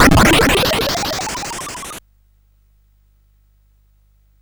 sid CSG8580R5

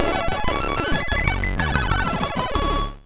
sid MOS6581R3

